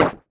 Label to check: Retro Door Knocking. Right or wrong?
Right